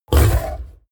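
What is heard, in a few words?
coloss snort deep short, creatures; kreaturen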